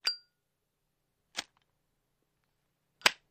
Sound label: Zippo Lighter Sequen; lighters & matches